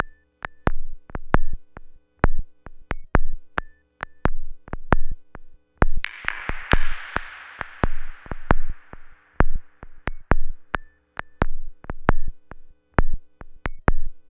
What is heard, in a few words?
Click Trick, Modular Electronica; Sonic Foundry (Sony Creative Software)